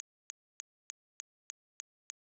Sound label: Clicks; Multitrack